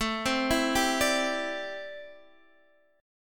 A Minor 11th A Guitar Open D

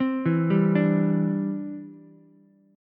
D 7th Suspended 2nd Suspended 4th D, Ukulele; D7sus2sus4 arpeggiated

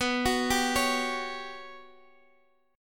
G Major 7th Flat 5th B

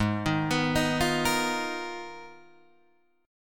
G sharp Minor 6th Add 9th, Guitar Open D

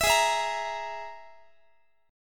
G sharp Suspended 2nd Sharp 5th, Guitar; G#sus2#5; strummed; JGuitar

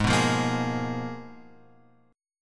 G sharp Minor 6th Add 9th; G#m6add9 Chord {4 2 3 3 X 4} strummed

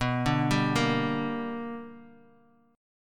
B Minor Major 7th Sharp 5th, chord

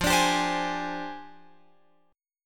F Minor 6th Add 9th F down Guitar Open F; JGuitar

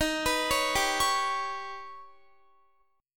D sharp Augmented 7th D sharp Guitar; D#+7 arpeggiated; x,18,21,18,19,20

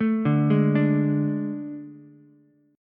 A Major 7th Suspended 4th, Ukulele; arpeggiated

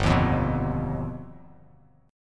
G Augmented 7th; G+7 strummed; 3,6,3,4; JGuitar

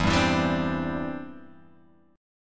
E Minor 7th Sharp 5th, down, Guitar, Open G

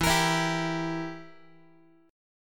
E Diminished down Guitar; Edim strummed; JGuitar

